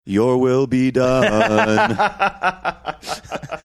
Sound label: singing your will be done